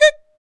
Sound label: FEM PERC, Vocal Jazz FX